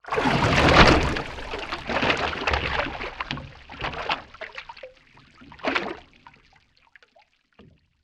Underwater Water Object Movement Splash Gurgle Bubbles Wave Against Boat